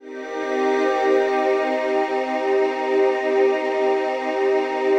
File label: CHRD PAD; Best Service; XX Large Pads; Sample CDs